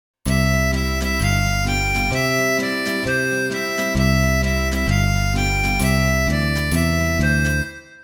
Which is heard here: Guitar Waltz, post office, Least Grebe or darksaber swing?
Guitar Waltz